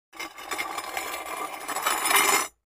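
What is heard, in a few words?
Large Tile Square Scrape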